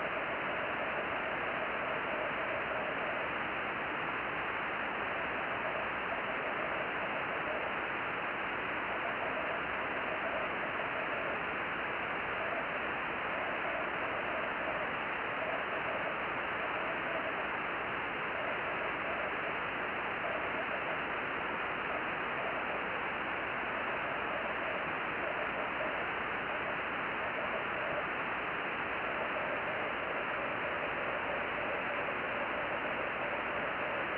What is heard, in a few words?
10 GHz Beacon, ham